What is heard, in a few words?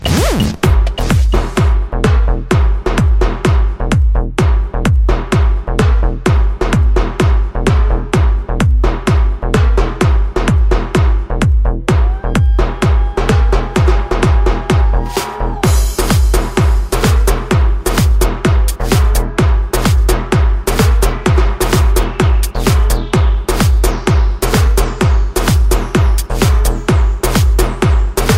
eletrohouse mix; bonus mix antiga